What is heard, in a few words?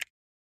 button Click; hotline